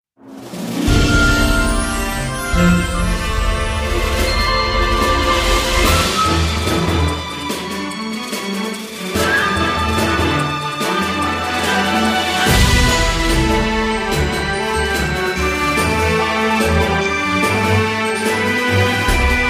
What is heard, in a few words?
Battle BGM